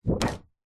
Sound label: hospital xray sheet set down on surface